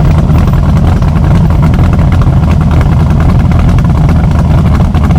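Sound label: cobra427 idle,car engine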